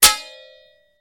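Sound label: Sword Clanks Single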